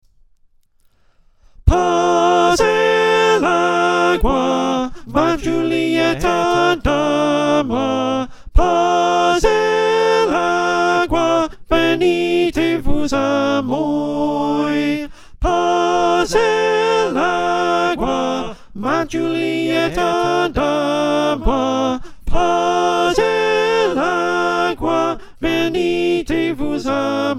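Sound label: Alto, choir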